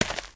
earth, human step

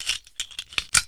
inv attach addon

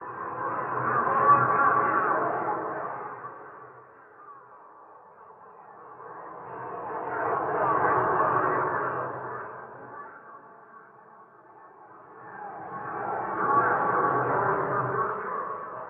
psy voices, anomaly

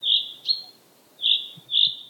new birds, ambient outdoor

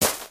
step gravel, human

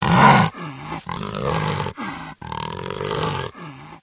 idle, pseudodog

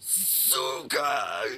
killed; stalker death

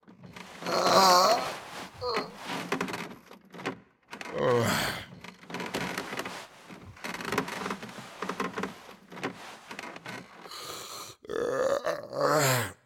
bed getup; device

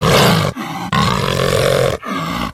pseudodog threaten

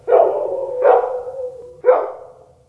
dog, ambient outdoor

